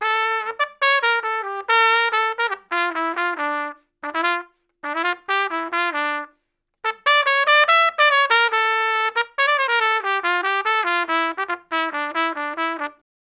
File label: Trumpet Solo, Jazz Traditional; Sonic Foundry (Sony Creative Software); Jazz Solos and Sections